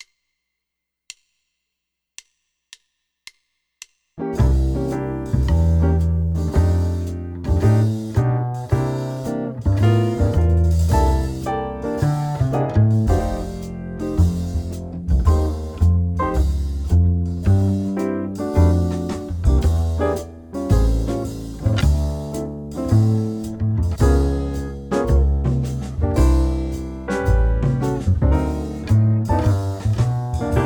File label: all horns blues, jazz; LMEA All-State Jazz